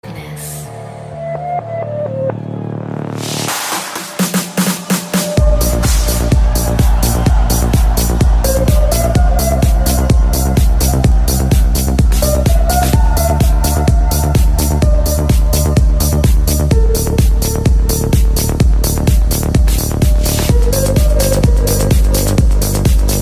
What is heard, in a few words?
trance ringtone; tomorrowland belgium